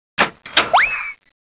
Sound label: Laser Lab Sound